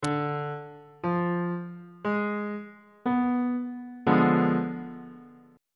b diminished 7th 1st inversion

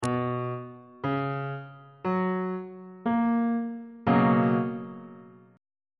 a double sharp major 7th suspended 2nd chord

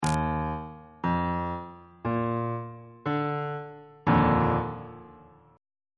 e flat major 7th suspended 2nd chord on bass clef

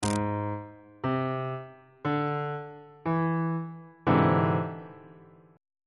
e augmented 7th 1st inversion on bass clef

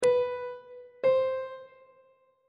b 1st intervals on treble clef

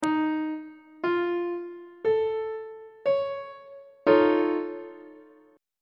f augmented 7th 3rd inversion on treble clef

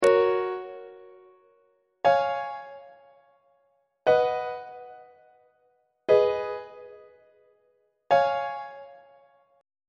f sharp major half cadence on treble clef

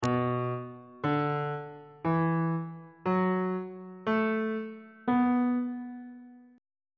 b minor pentatonic scale on bass clef

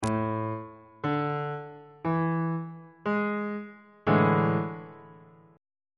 a major 7th suspended 4th chord on bass clef

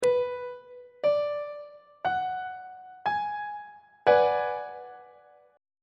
b minor 6th chord on treble clef

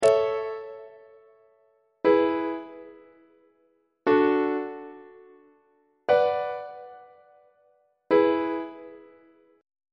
a major half cadence on treble clef

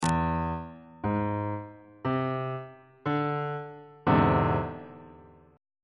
e augmented 7th chord on bass clef

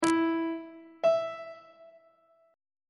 c quadruple sharp note on treble clef

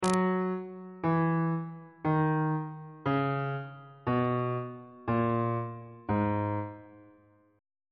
g sharp aeolian mode descending on bass clef

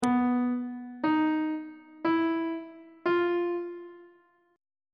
c flat dim4 perf4 aug4 intervals on treble clef

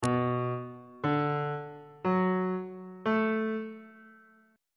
g flat minor 7th chord 4 is c flat minor 7th

